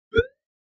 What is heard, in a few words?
Pop Up Short Elect Whi, pop up element